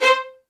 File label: C5, LOUD VIOLINS; Q Up Arts; Denny Jaeger Master Violin Library